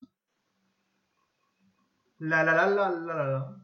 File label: lalalalalalala